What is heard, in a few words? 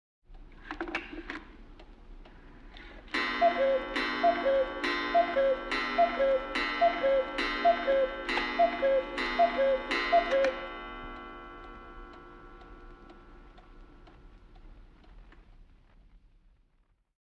Cuckoo Clock; 100 sound effects